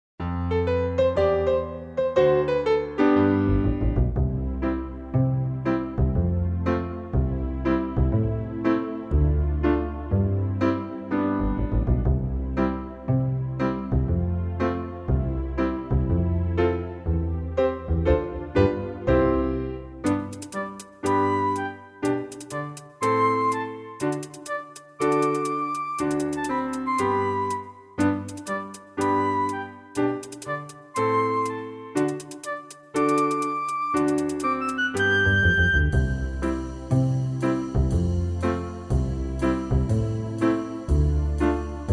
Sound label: Instrumental; Bo tambe kier skirbi